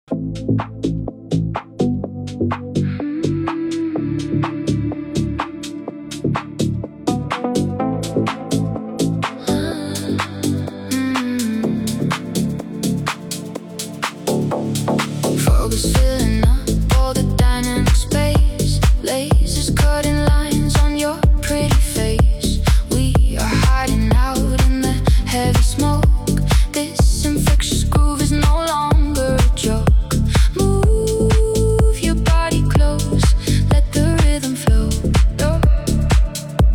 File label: Techno House